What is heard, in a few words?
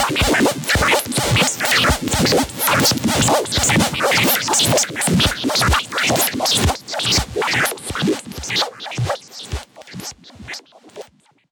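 Modulation Processed, alarm siren samples 125bpm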